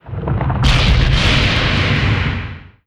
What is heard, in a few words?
HOLY STONE KEEPER war attack